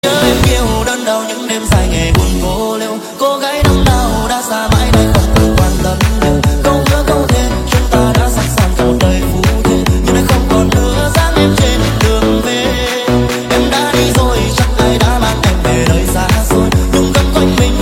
nhac chuong remix

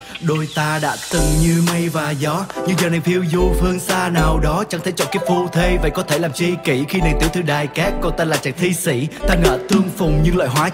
Nhac Chuong (Rap)

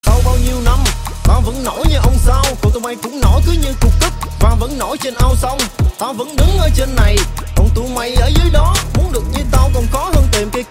nhac rap

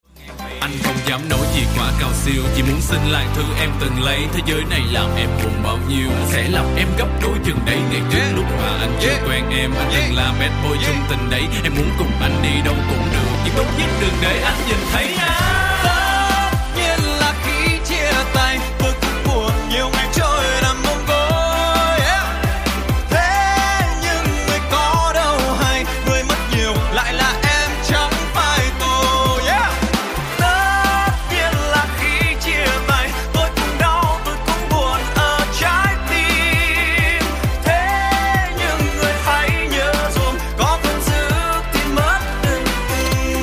Buon Rap Viet

